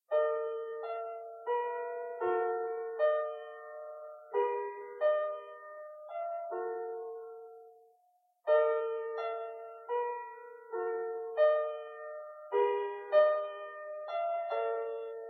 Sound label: sonata - III andante